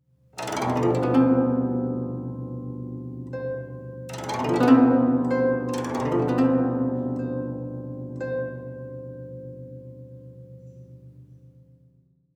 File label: Guzheng